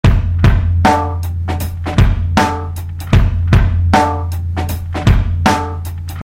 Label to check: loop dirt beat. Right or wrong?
Right